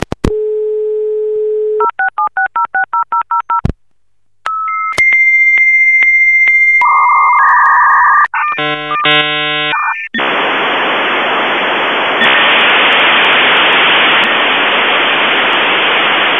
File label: dialup